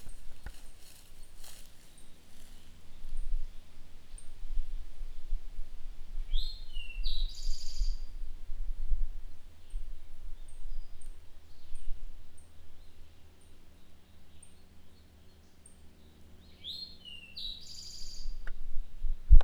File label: Eastern Towhee